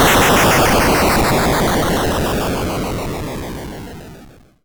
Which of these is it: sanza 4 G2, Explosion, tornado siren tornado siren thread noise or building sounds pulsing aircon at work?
Explosion